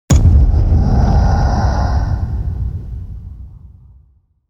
hit big

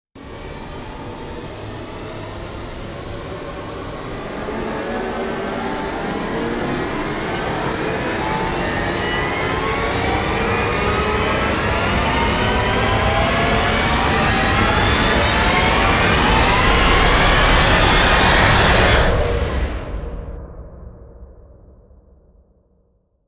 supercharge rise

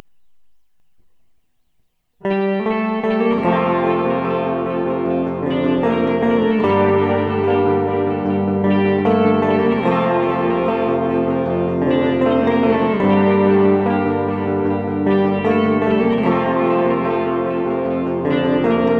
acoustic

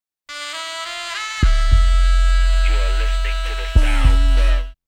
fx and scratches

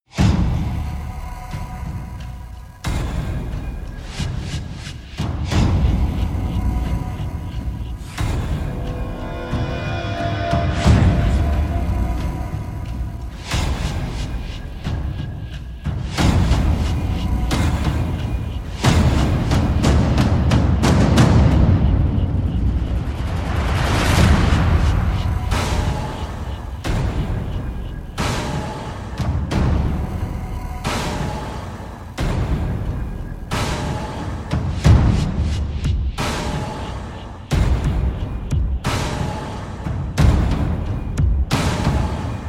perc only

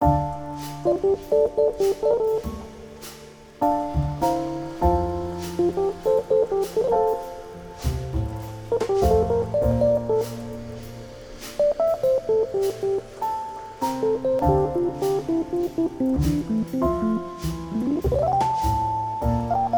bossa nova soundscapes

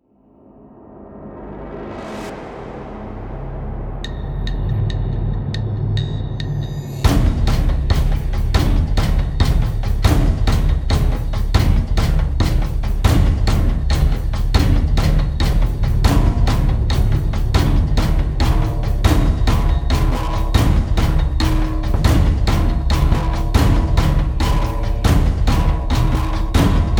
drums fx

